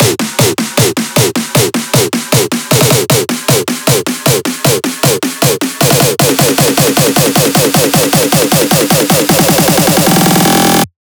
buildup loop 155; GhostHack

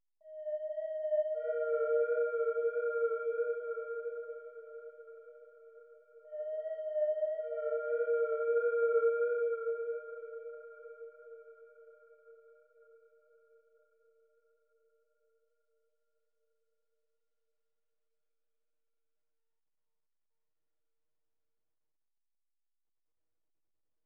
159 BPM D# Min Pad, loop stems; Melody; Cymatics; 2022 Melody Collection Platinum Expansion; Sky Blue

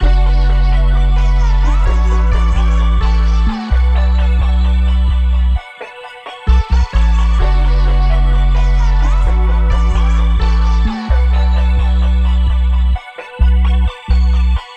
Full Bm 130, Trap Kits; Melody Kits; Ghosthack; Flowers

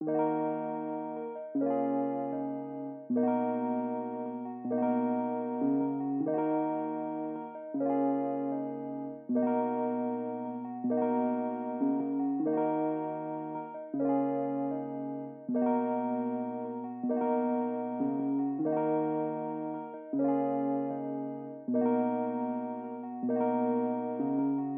Classic Melody Loop - 155 BPM D# Min Rhodes; Loop Stems; Cymatics; 2020 Melody Collection

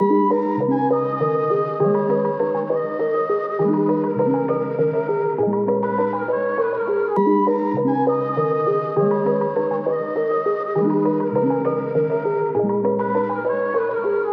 Various Melody Loop 134 BPM G Min; Cymatics; Oracle Sample Pack

